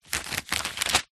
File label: household telephone directory yellow pages page turn